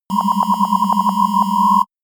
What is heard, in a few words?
shot ringing wobble